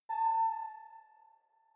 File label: sci-fi facility ai awake; soundpool